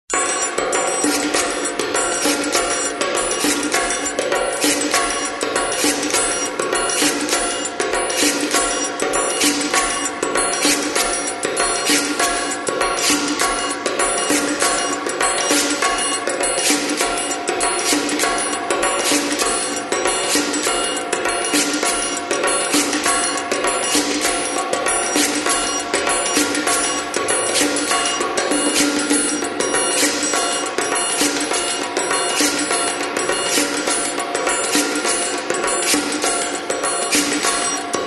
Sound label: Percussion